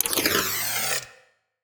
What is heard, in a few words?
build; seeding planets